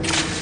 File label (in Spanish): corte; boom desempate final